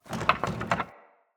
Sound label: Cage Rattle; Midrath; Avakot